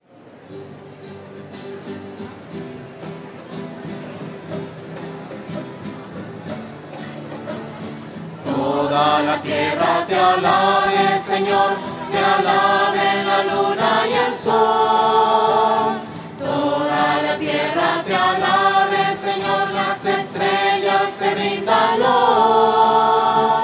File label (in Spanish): voces; misas 2012